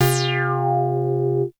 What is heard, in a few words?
SYNTH